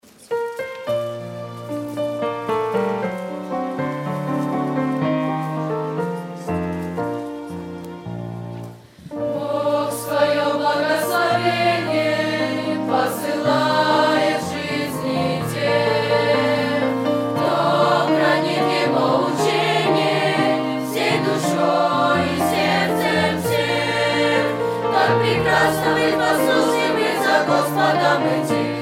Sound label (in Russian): пение Бог Свое благословение; 2016 Апшеронск, Подростковый лагерь